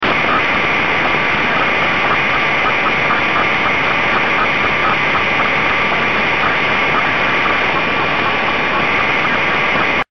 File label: static